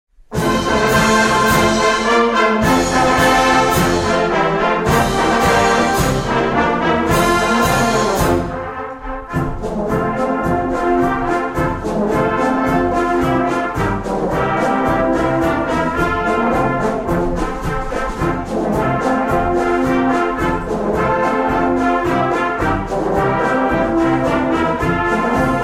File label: Brass Band